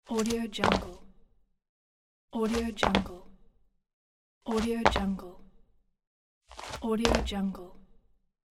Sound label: jumping on stone footsteps